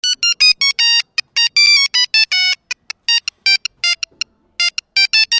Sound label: main theme siemens, Polyfonisk musik; midi